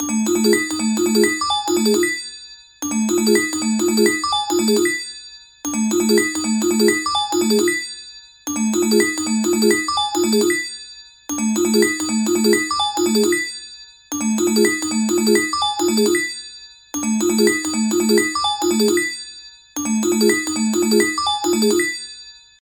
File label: Ring